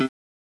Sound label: gui menu